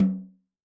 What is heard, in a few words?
Lite Hi, Tom, Yamaha DTXpress-II